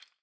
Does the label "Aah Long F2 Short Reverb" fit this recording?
No